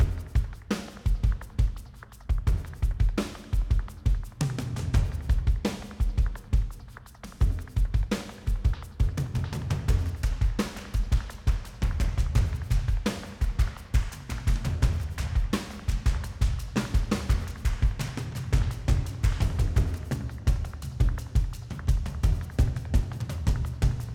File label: Combat E- Tower1 Threat3 170 Bm